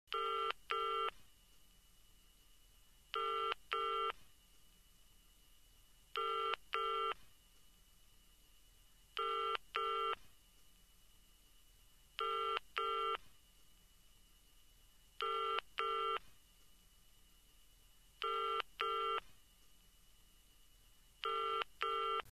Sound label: ringing